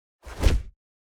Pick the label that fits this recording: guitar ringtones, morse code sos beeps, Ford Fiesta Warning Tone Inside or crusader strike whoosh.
crusader strike whoosh